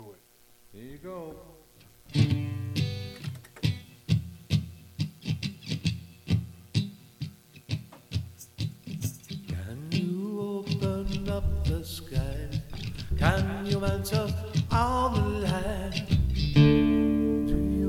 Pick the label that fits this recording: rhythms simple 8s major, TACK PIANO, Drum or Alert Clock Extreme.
Drum